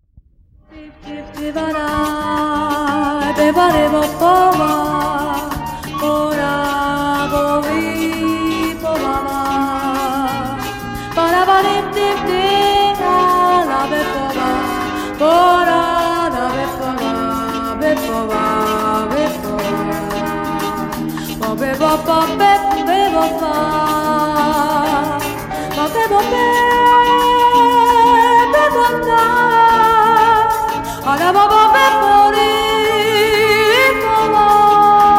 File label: bossa nova